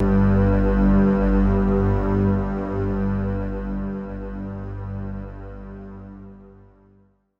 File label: sounds music; Halo Combat Evolved; Xbox